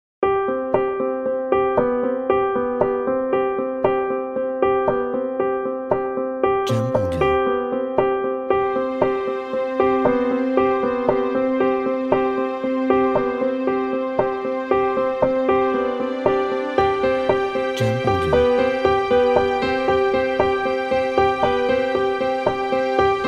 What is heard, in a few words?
Corporate Success Business